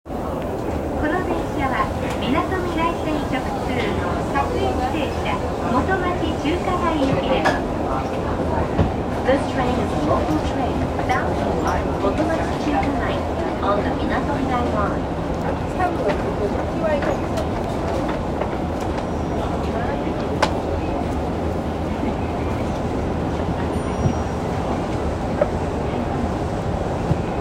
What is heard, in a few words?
train sounds; 東急東横線渋谷南